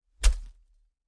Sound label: Human body parts being chopped or amputated. Mutilation, Body Dismemberment, Gore Chopping, Flesh